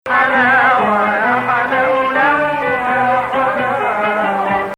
Hijaz

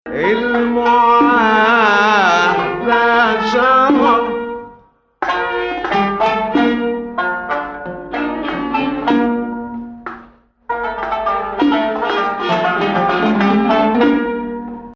Dalansheen Ijma3 Shufna 2nd Rast; The first half of this complete recording is an extensive and beautiful mawwal in Rast

